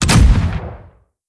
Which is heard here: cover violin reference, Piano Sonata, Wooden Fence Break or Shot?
Shot